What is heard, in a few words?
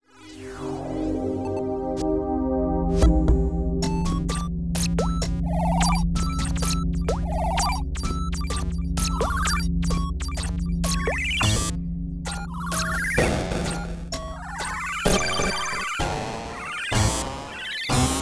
Power; Bit.Trip Void